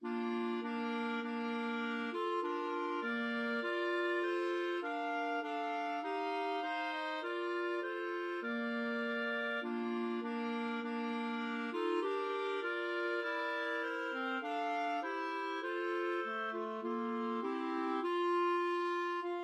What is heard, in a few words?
clarinet trio